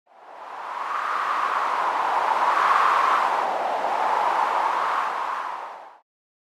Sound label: wind